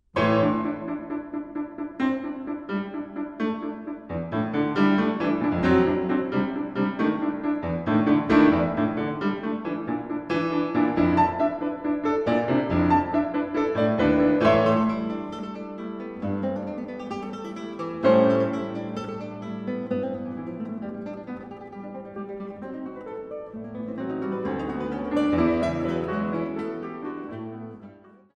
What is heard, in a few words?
Piano Sonata No 1 Opus 22 Allegro